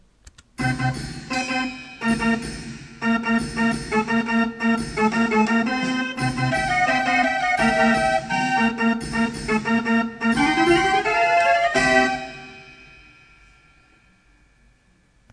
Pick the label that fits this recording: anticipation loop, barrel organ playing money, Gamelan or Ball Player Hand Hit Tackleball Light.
barrel organ playing money